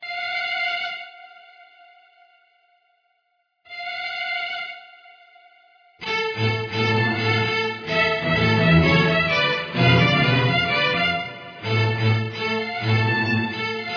D Minor Dark Strings

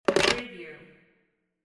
phone hang up

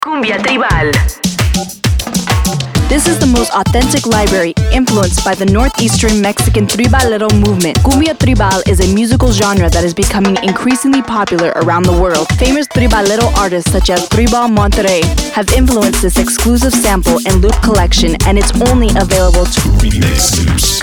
Cumbia Tribal

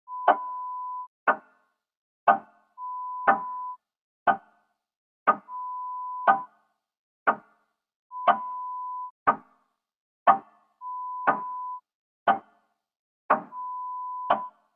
Lo-Fi

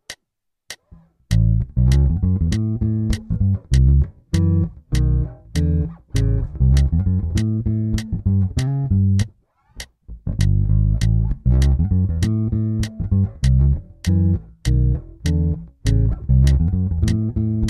A7 bluesy riff with turnaround

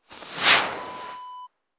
firework rocket launch

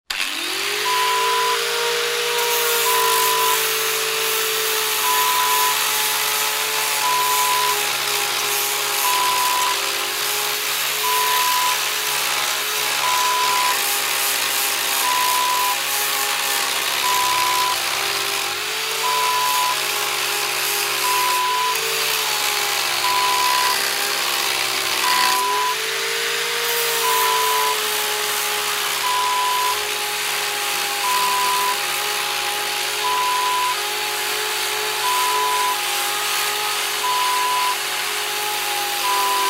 angle grinder

